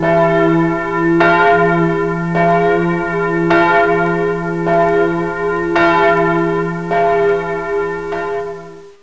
churchbells

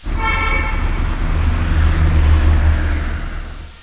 dead honk, traffic sounds

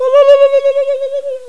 indian call, human sounds

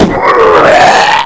puke

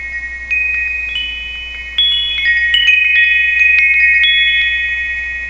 Piccolo, windchimes sounds

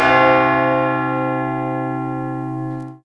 churchbell

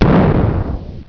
damage, explosion sounds

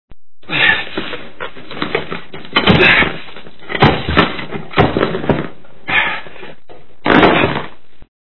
ball chain

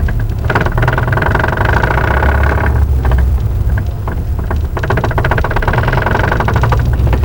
damaged car engine

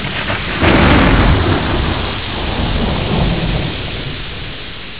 thunder rain

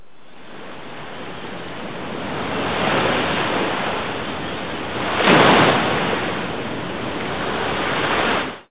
waves, ocean sounds

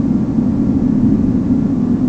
underground wind

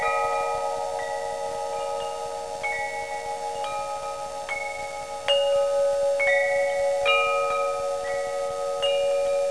Ultimate Windchime

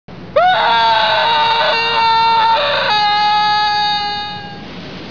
falling, scream

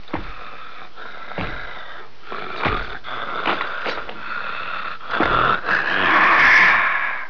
Monster walk and grunt

